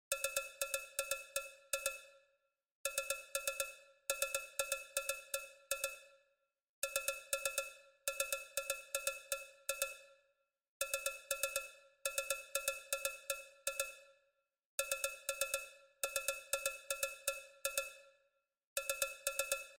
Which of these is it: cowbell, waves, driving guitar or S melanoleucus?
cowbell